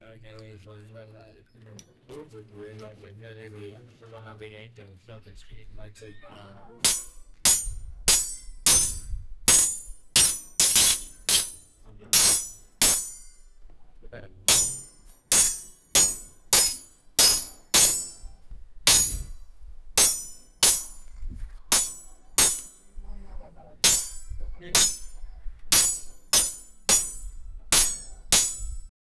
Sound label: swing of swords and backg, conversation